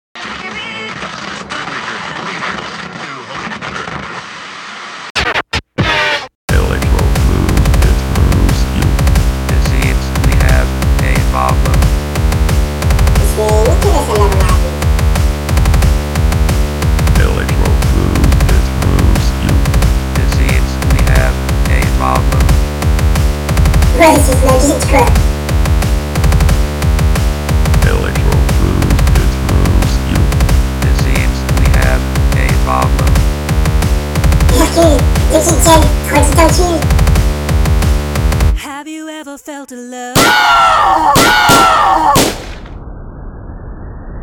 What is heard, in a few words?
dub